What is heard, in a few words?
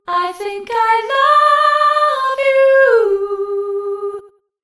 Dual Delay Doubling Echo Wet; ClonEchoes; Syntheway